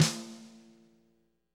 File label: drum snare loose